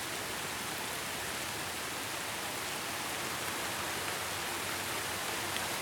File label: rain, ambient weather; Minecraft; Votive World